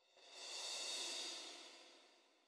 music interactive; World of Tanks

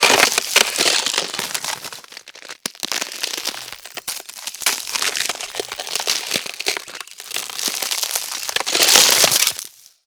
pick ice cracking

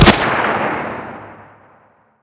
medium far distant, weapons; Modern Warfare

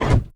Canopy Close End, Aircrafts, FW-190D9; DCS

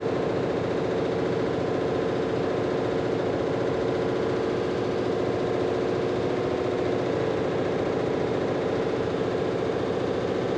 ship engines; World Of Warships

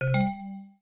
Windows XP Hardware Insert

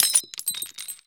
physics glass shards default

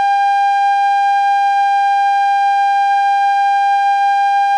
Aircrafts Cockpits RWR Lock; DCS

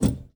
Aircrafts Cockpits Throttle Lim; DCS

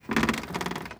foot wood creak, footsteps; Call of Duty Modern Warfare

